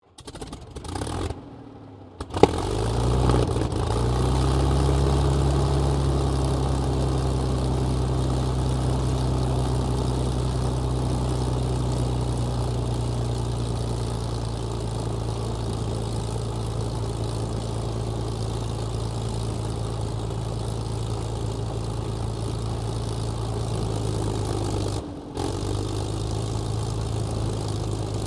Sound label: AIRCRAFT P-40F KITTYHAWK STARTUP; 1942; P-40F Kittyhawk / Warhawk/Tomahawk; Airplanes; Vehicles